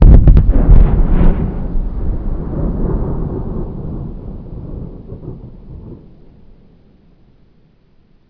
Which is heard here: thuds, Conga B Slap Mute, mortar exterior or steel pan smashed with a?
mortar exterior